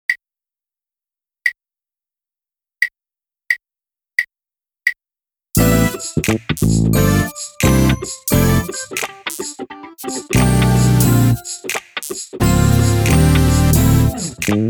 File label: drumless